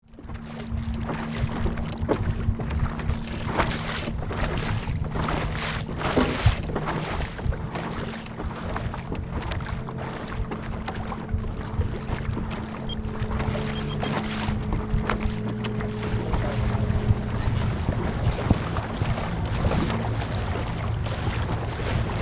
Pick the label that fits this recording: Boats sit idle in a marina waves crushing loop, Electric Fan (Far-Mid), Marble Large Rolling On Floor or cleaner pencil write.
Boats sit idle in a marina waves crushing loop